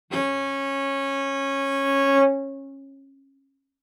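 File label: Cello arco fortissimo sul A Db4; MIS Pitches - 2014; Strings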